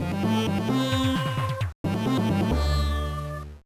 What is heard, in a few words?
Item Received; prince of persia the sands of time 2003 gba